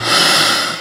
breath, drum machines

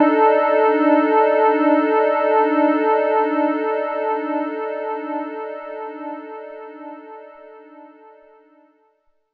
Zap Chord, Pads; Drum n Bass; KJ Sawka; Drum n Bass & Beyond

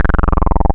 Pulse Hi Res, wavetables; Pulse 3 Hi Res; Animoog

